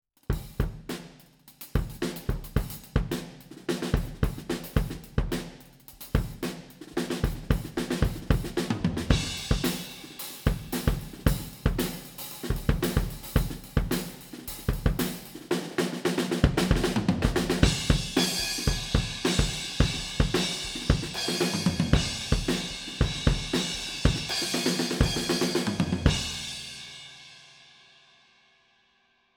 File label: kick head on